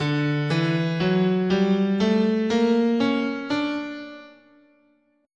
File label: D Harmonic Major, guitar, scale